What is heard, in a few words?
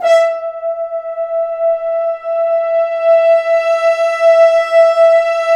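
BRS, French Horns sfz; Roland L-CDX-03 Disk 2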